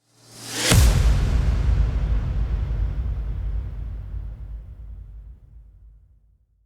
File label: Impact with Sweep